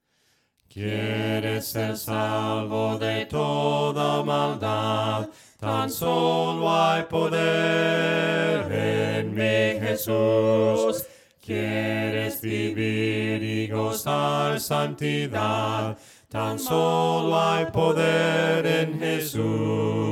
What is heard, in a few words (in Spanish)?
QUIERES SER SALVO VOCES